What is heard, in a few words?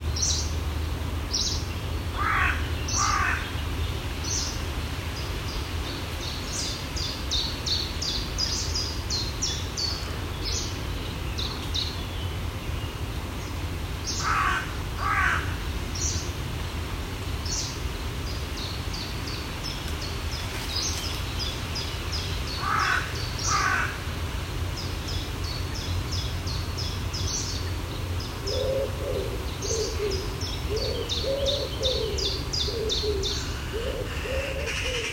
vlaardingen call